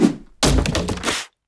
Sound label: transform